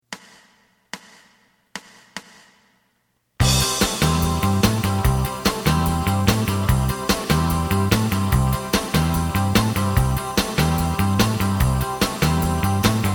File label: key